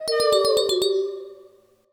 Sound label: Player Death; UnityShootingGame